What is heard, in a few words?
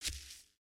Foot Grass Walk, footsteps